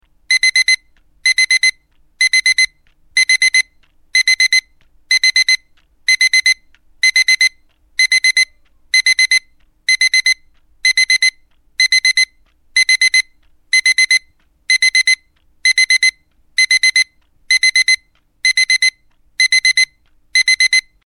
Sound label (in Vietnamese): Âm thanh khó chịu nhất